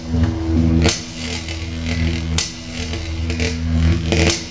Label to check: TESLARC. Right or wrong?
Right